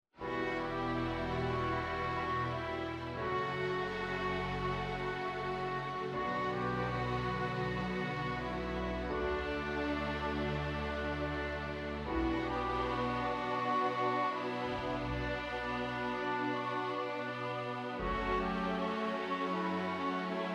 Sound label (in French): Accompagnement à l’accordéon; Orchestration